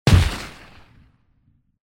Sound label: desert eagle